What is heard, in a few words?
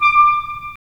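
wind clarinet; Roland - LA Composer vol. 3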